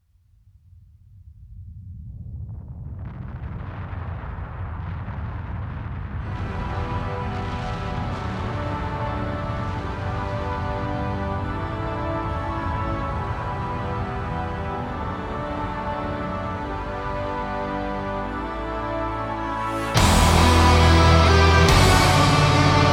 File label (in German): Ankunft (Instrumental)